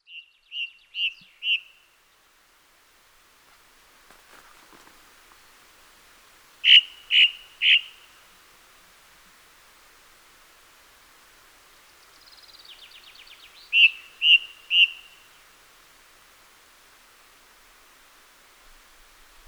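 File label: sounds of thrush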